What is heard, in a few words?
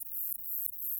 song, katydids, neduba sambagiosa